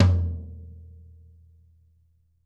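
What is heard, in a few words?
floor tom; drum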